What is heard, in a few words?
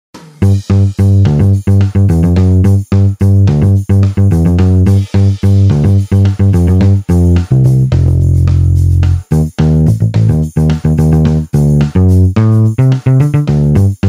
e bass; HALion6 : E.Bass; SR Bright Finger